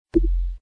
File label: gift, ui; croods